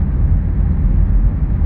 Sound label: road roll V8; F1; FSR 2011